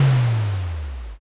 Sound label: earth magic